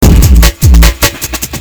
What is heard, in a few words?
Loop